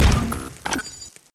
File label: Pulse Rifle Reload